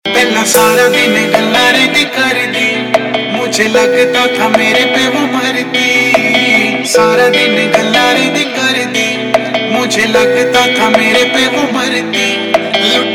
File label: Rnb Version; Punjabi Pop Ringtone